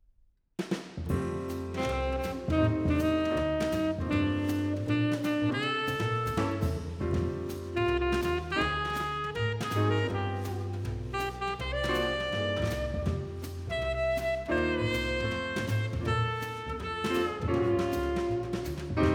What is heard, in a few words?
Neumann U 47, microphone; Das Mikrofon, Vol. 2; The History of the Condenser Microphone